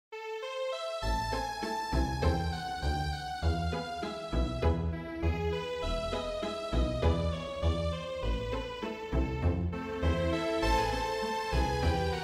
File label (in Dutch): Strijkensemble, Tango Orkest